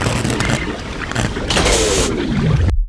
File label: DROWN; waspy